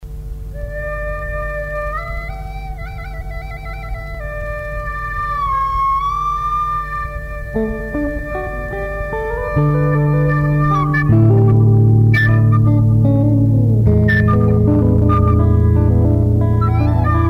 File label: sparrow